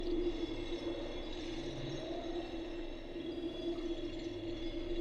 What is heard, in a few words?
troll smash loop; trundle; LoL